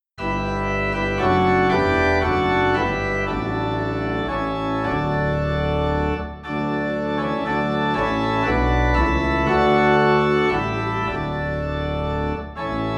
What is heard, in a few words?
Organ Low in D; Grace Greater Than Our Sin